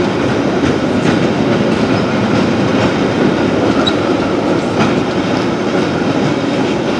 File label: tram; Mafie 1